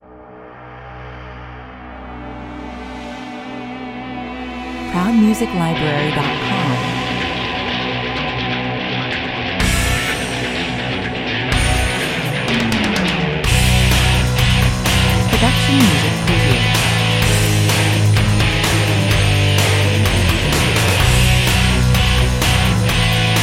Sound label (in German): Heavy Metal, herrlich trashig und unkompliziert, die reinen Basics - harte Riffs und Beats ohne L; 127 bpm; Heavy Metal, herrlich trashig und unkompliziert, die reinen Basics - harte Riffs und Beats ohne Lead-Gitarren